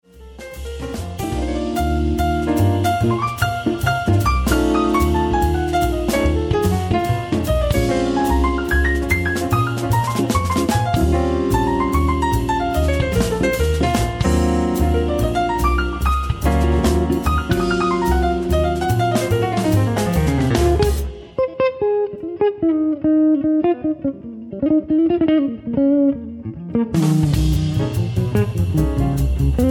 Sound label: solo